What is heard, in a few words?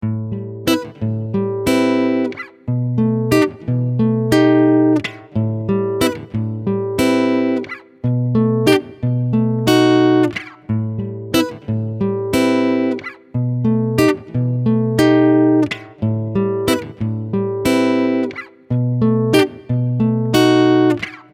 Guitar 90 BPM (A#min); Guitar Loops; Brainstorm; Ghosthack; Guitar Loops & Shots